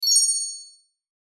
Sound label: chime light; Chimes; Multimedia; Blastwave